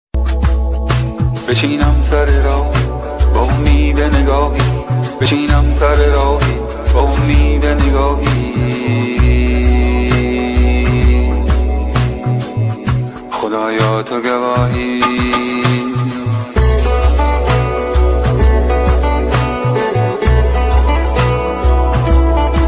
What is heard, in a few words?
peace bgm; buddha; brave beats